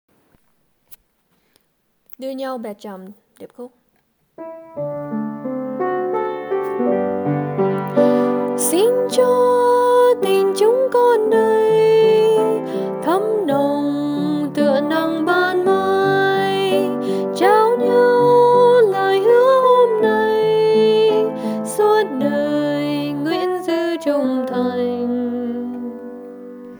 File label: Alto Bass